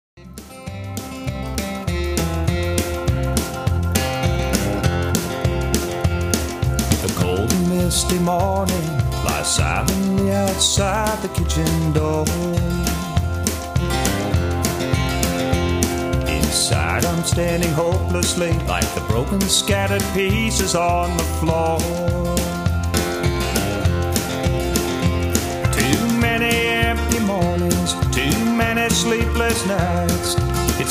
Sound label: Fast Train